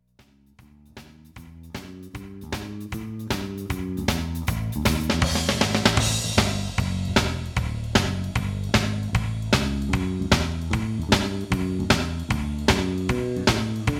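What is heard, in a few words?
reverb medium room; audio examples from the book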